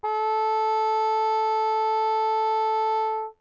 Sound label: trumpet